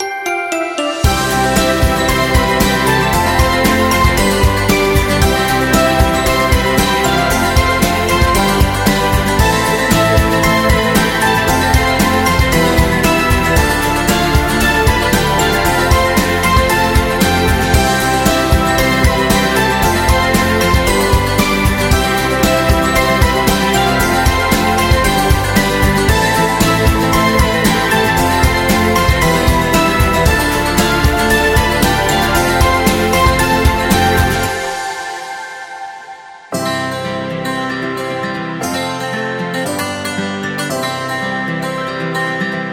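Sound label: Farm, Upbeat and happy background music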